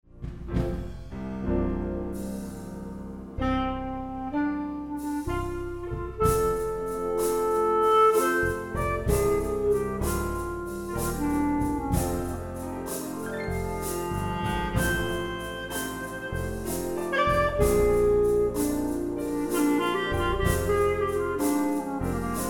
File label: Mic MXL990 mix, clarinet